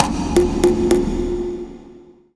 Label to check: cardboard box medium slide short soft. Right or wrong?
Wrong